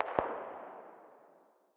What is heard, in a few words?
dmr very far distance, 7.62x51mm; tacz-tweak-pack; taczmechanics